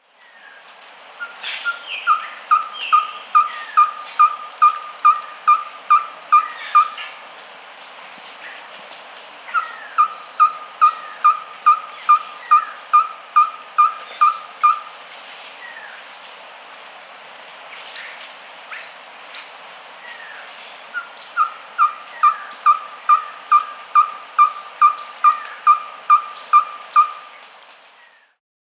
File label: Ferruginous Pygmy-Owl, Glaucidium, Nature's Songs, S. America